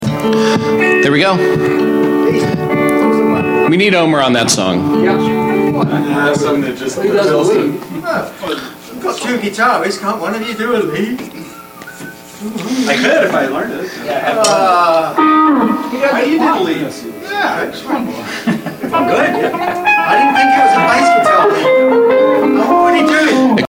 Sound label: Background Mic Mix